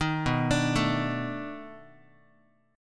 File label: B 7th Sharp 9th, down, Guitar; arpeggiated